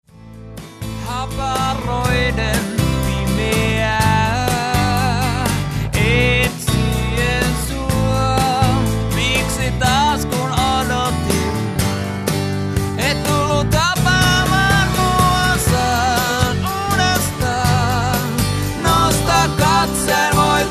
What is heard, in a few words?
unplugged